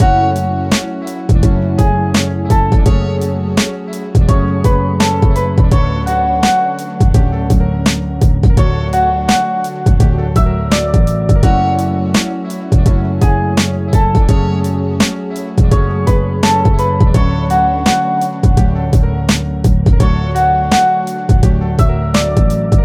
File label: Dark Hiphop idea